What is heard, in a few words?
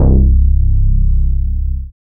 BASS MINIMOO